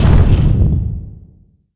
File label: unit explosion